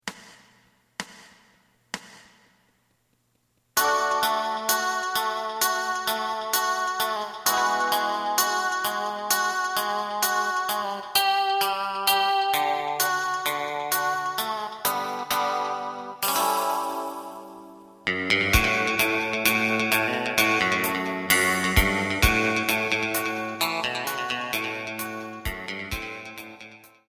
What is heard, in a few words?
bass